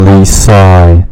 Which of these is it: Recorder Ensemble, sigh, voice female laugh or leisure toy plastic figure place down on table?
sigh